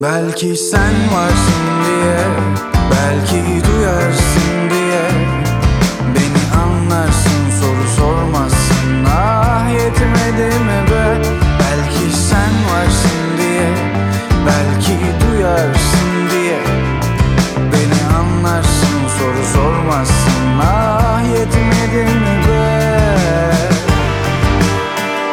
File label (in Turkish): zil sesi